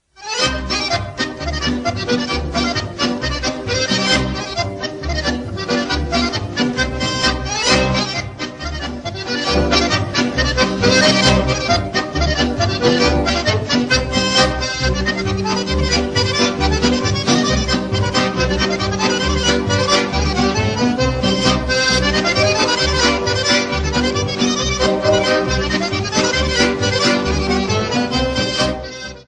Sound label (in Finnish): säkkijärven polkka